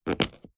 food sweet potato raw single cut chop with large knife